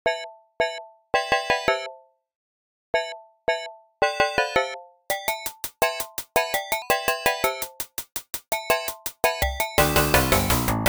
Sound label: Commercial Rhythm